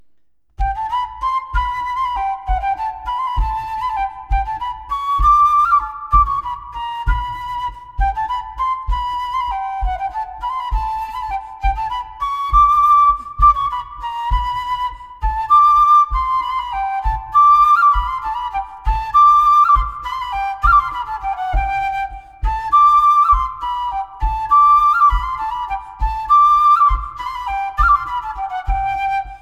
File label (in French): Ronde d Auvergne; Panseron